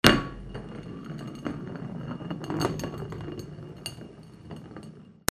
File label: FALLING